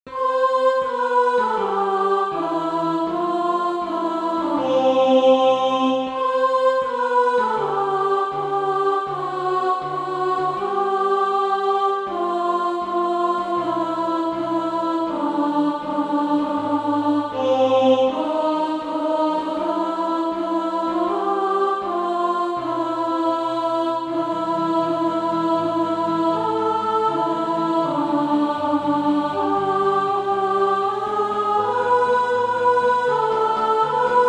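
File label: alto, Practise Music; SATB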